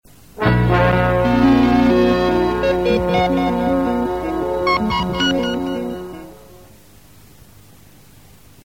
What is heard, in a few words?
jingles